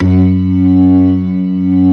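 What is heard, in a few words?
B3 low distortion slow, ToneWheel Organ; E-MU Formula 4000 Series Vol. 3 – Analog Odyssey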